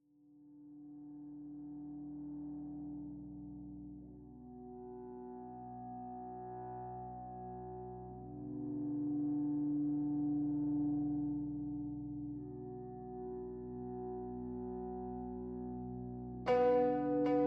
Tension Block

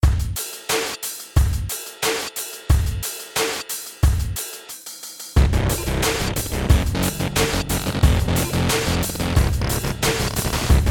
bossfight; Ginsha

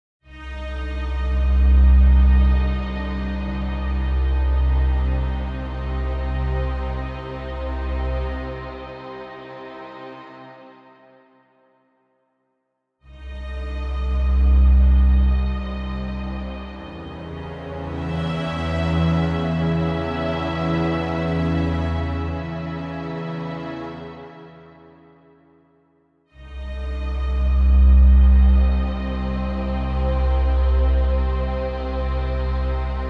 Interrogation bg strings loop; The Detail ios linux macos windows gamerip 2014

